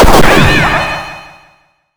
Retro Magic

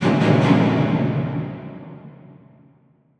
Stabs Strings Mid, horror strings

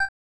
selection, 8 bit sound effects; Main menu

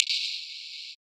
Click Lock Fail; FREE SFX PACK VOL 2

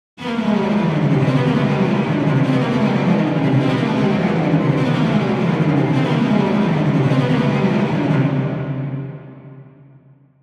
Adrenaline Strings Mid, Horror Strings

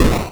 hit deep

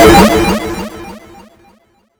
Retro Event Complex

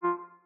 Button Confirm

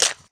mud

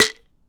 Bottle Pop, pill bottles

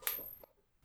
coin toss

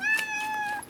Cat Meows - Food Time; Micro Pack

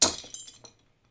crystal smash

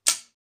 metal

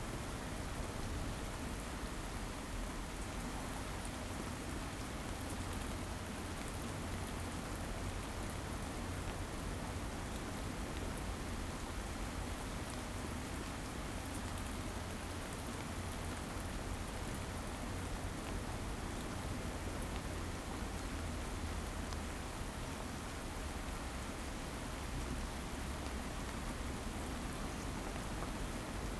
Ambiance Rain Calm Loop Stereo; Nature; Essentials Series NOX SOUND

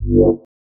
Allert Dub; FREE SFX PACK VOL 2

